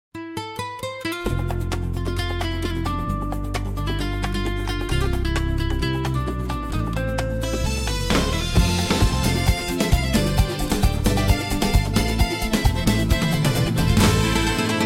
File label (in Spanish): Mar y Guitarra; teneryfe bailando